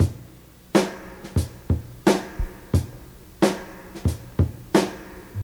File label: 89 bpm high quality drum groove f key